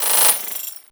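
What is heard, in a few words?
glass uzi left; Spectrasonic Distorted Reality 1; BIZZAROS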